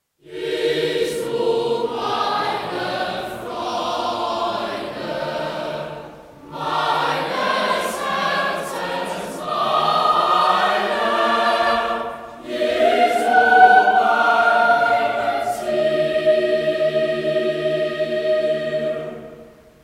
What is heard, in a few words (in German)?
Choral; Jesu, Meine Freude